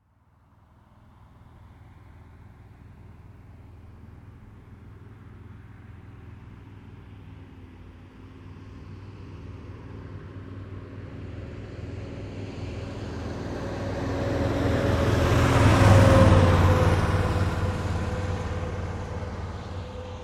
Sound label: Vehicle Truck Pass By; Trucks; SFX Bible 6